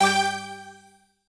countdown

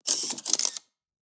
equip sound

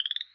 chirp; Ivy

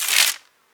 shot blocked; The Swapper

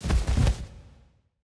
cloth; CrossCode; boss; gynthar

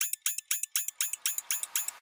bomb tick

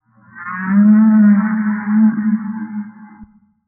whale call; boss

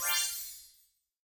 drop legendary gold

